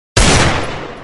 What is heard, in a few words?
gun sound desert eagle; pubg